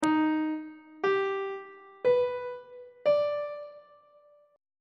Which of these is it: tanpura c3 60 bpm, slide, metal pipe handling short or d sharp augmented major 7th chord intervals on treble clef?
d sharp augmented major 7th chord intervals on treble clef